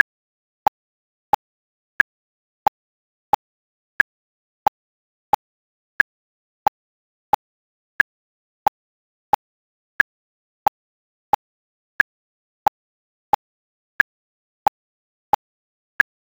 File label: 3-4 rhythm metre meter time measure